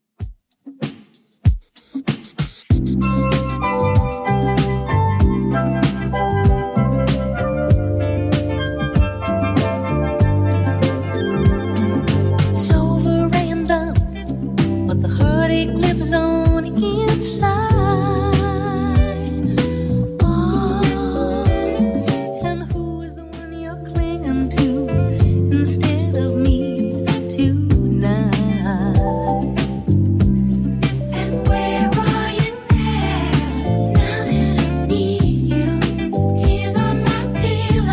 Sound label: 70s Redrum 96bpm